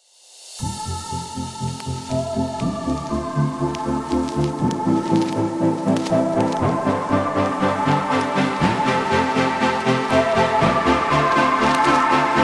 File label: Chill Trap Inspire